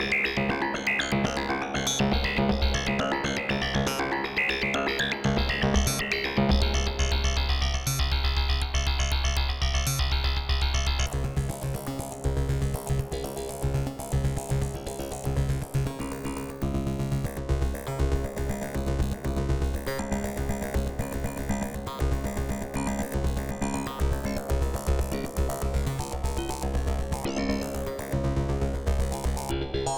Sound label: graphic vco fiddle